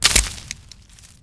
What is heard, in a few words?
bush; Mafie 1